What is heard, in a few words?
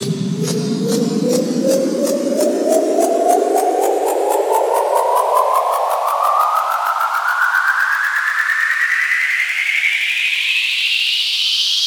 Loop And Noise